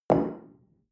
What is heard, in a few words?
shell place on tablecloth hard; berufe; gastronomie; soundpool